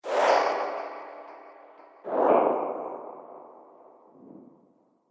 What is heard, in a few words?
Magical Twin Whoosh